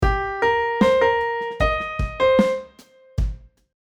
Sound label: piano and keyboard blues licks